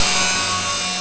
cymb, cymbals

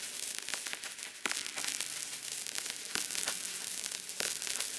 Cracklature, sparse soundscape

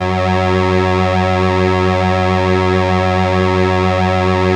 SIEL, String Machines

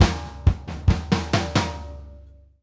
TOM SNARE; Sampleheads - New York City Drumworks VOL-1

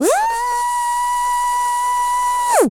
DRILL; Spectrasonics Vocal Planet; Jazz FX

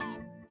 VIBRA; Spectrasonics; Metamorphosis

